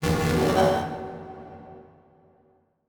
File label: Transition Communication Distortion Scanner